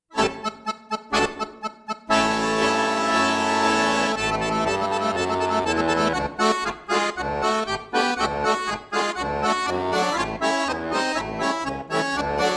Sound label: Czech Polka, Accordion